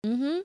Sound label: mhm